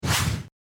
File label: Popup out